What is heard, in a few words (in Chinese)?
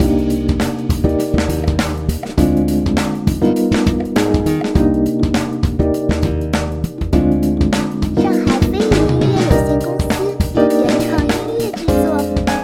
电子时尚节奏; 录制现场